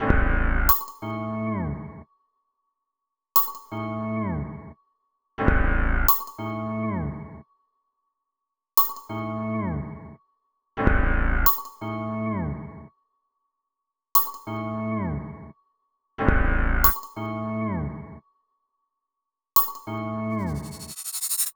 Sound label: Melody